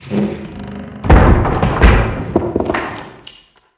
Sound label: large table tipped over; Max Payne; manor